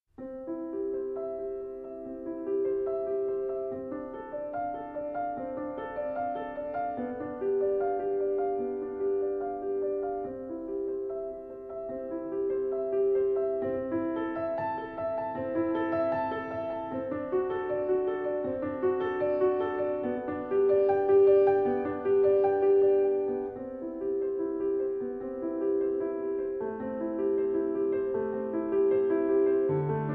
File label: Romantic Piano - Ave Maria; Mozart